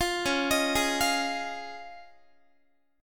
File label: C sharp Major Add 9th, Guitar; C#add9; arpeggiated